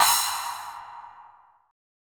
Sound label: crush; osu